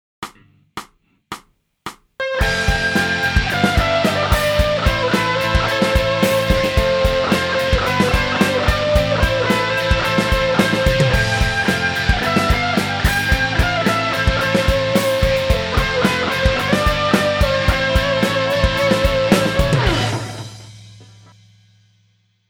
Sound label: F G Am